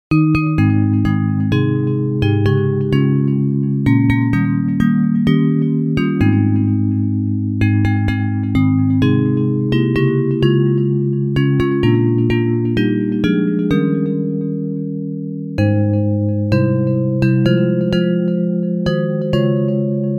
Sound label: Bells